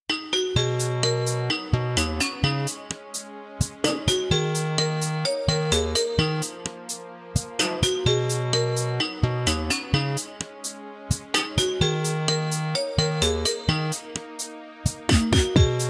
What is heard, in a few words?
bgm